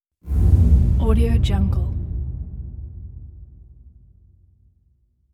dramatic low impact with dispersing tail; hollywoodedge

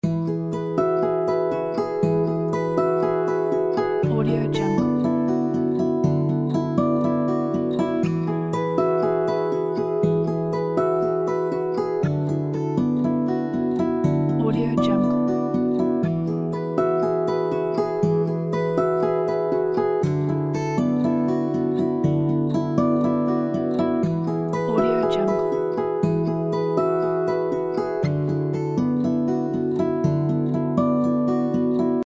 thinking time

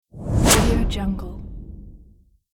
arrow whoosh sound

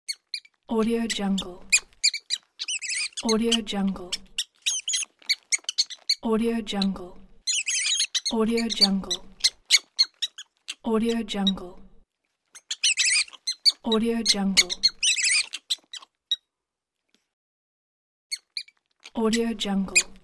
rat squeaking

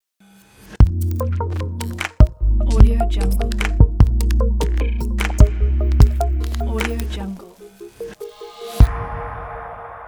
logo future tech

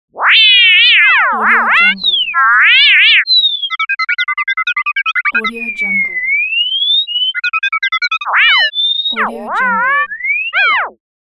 droid robots

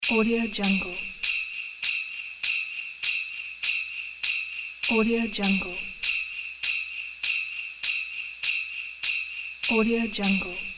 christmas bells

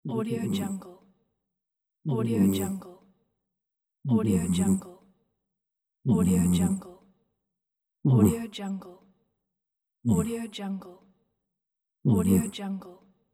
old cat snoring; mountainaudio

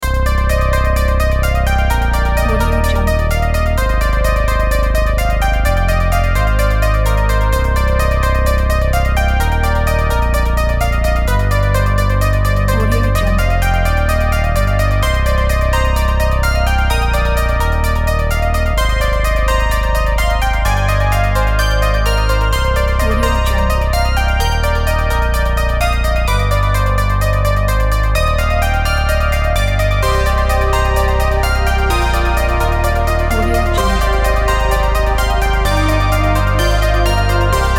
synth city

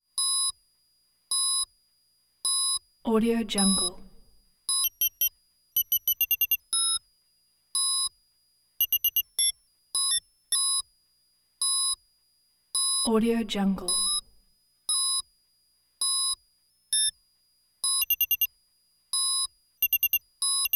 graphics or telemetry

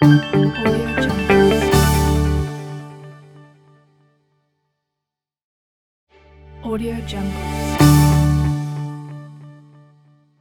inspirational corporate logo

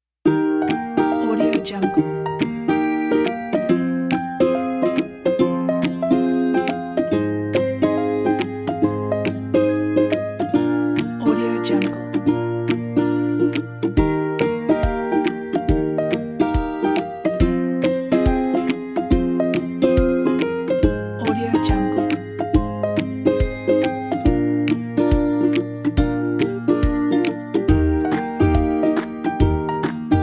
the happy; hitslab

